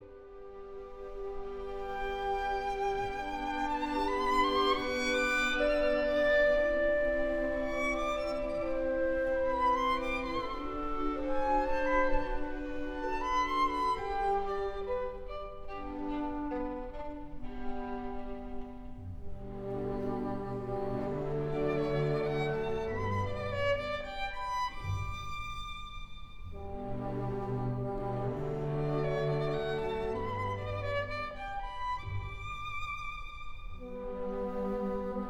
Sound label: Violin; Beethoven Violin 1; Masur